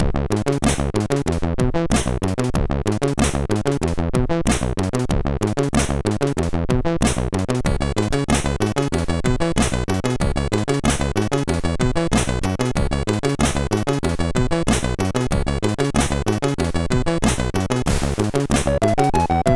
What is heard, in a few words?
sid MOS6581R3; Out of Tropic